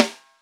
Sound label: rimskin, snares real